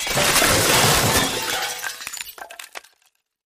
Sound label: Metal Wood Glass, mixed